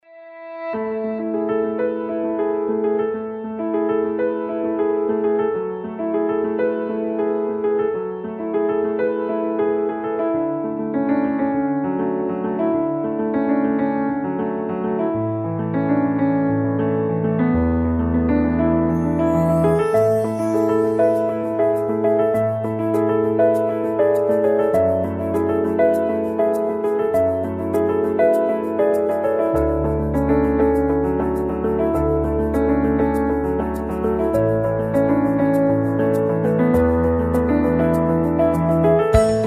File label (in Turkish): SANTRAL MUZIK